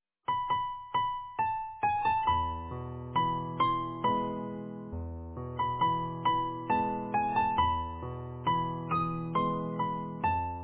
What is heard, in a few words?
easy piano